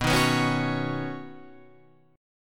B Major Add 9th, B, down, Guitar Standard; Badd9; strummed; JGuitar

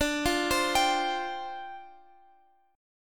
D Minor 11th D, Guitar, Open F; Dm11 arpeggiated; x,x,14,12,15,14

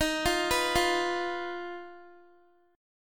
D sharp Suspended 2nd Sharp 5th D sharp Guitar; arpeggiated

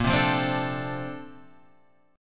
D-Minor 7th Bb, chord

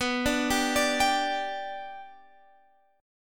B Minor Sharp 5th, Guitar, Half Step Down

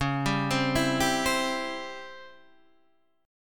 C sharp Minor Major 7th Flat 5th, Guitar, Open D; 11,10,10,10,10,10

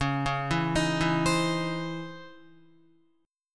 C sharp Minor Major 9th, Guitar; arpeggiated; 8,4,4,8,4,8; 4F,4A,5C,5G,5C,6E

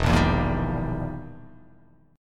E Minor Major 7th Flat 5th, E, down

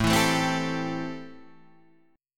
A-Minor 7th A; 5,7,7,5,8,5; strummed; Am7

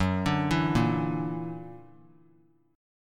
Gb Augmented 7th Gb down 3C,3F,3A,3D; Gb+7 arpeggiated

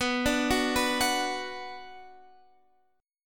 B Minor B Guitar Standard; 19,17,16,16,19,x; Bm arpeggiated; capo=3

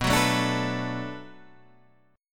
D 6th B, chord

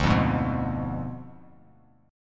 D Diminished 7th D down Bass Standard; strummed

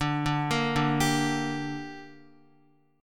D Suspended 4th Sharp 5th D down Guitar, chord; arpeggiated

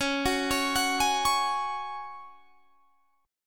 Db Suspended 4th Db; arpeggiated; 21,21,23,23,21,21

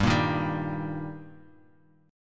G Minor Sharp 5th, chord; strummed; 15,13,13,12; JGuitar

